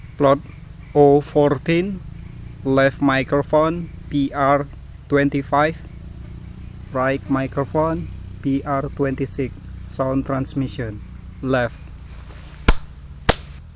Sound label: birds